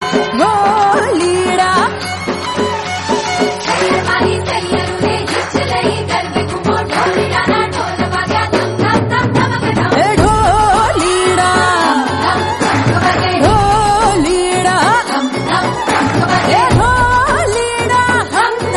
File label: trailer bgm; gangubai kathiawadi